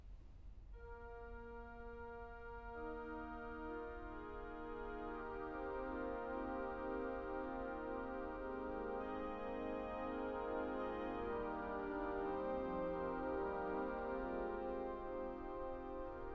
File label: Impro strings